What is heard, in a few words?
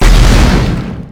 explosion; IdleRPG2024